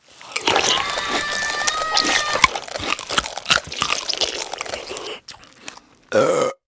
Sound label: eat